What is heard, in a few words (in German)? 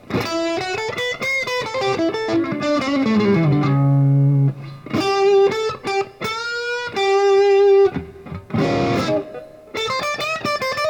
Hals & Steg PU overdrive